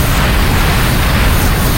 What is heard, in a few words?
fly; hellblazer